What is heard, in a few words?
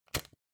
Lighter Flick